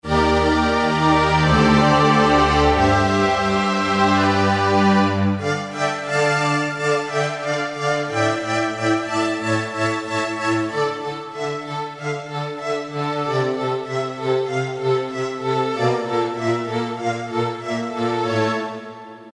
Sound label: String Ensemble, Roland D-50; Factory preset demos